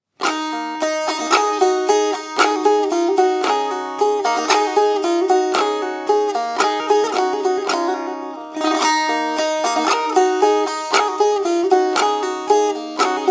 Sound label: Premium Tenbur